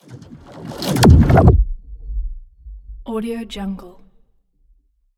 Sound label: water growly organic hit; soundmorph